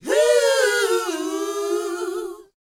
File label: DANCE 120, Vocal; ILIO; Vocal Planet VOL-4; R&B & Dance